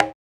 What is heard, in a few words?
Percussion, House